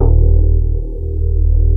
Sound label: STRIA, Twisted Textures; STRYNGI